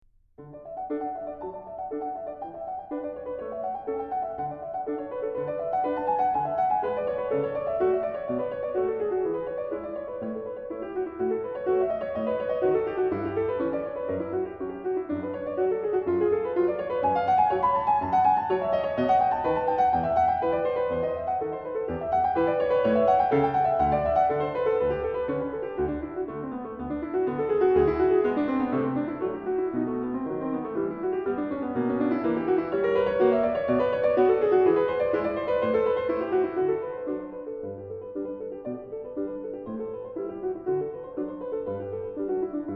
Prelude in D, piano; Bach; Prelude 05; BWV 850

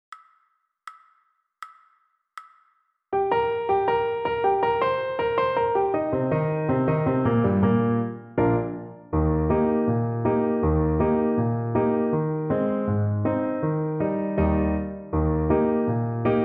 piano

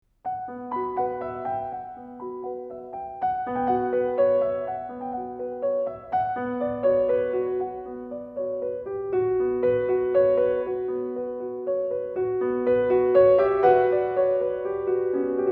Allegretto, piano; Edwards

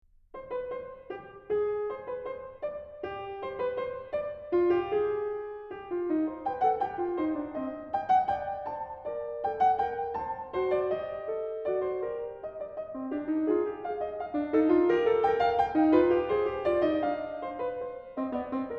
Fugue in C Minor, piano; Bach; Fugue 02; BWV 847

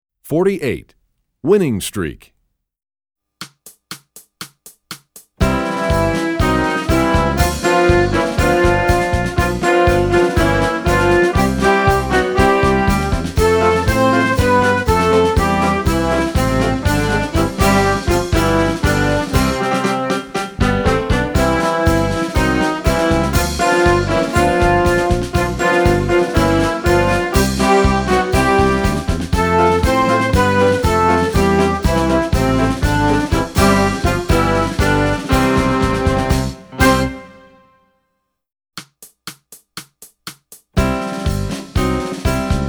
trombone; essential elements

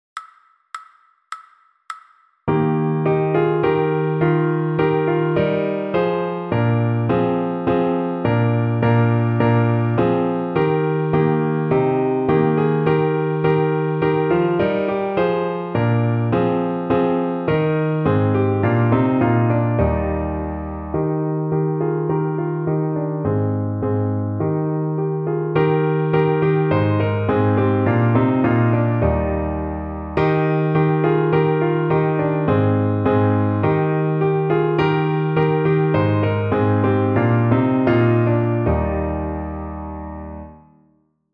piano slow; Den VI Ronde

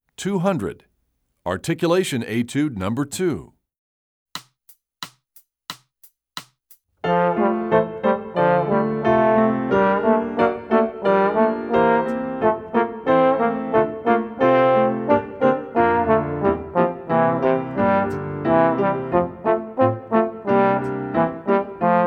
Articulation Etude, trombone; essential elements

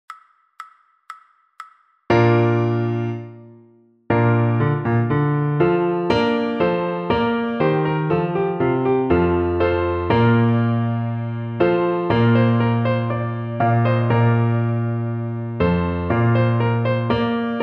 piano fast; Trumpet March

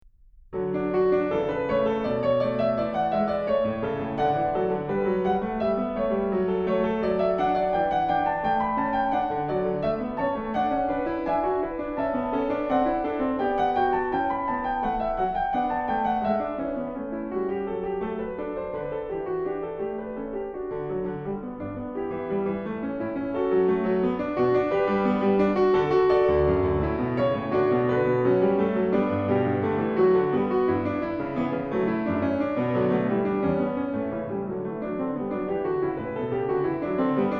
Prelude in D, Piano; Bach; BWV 925

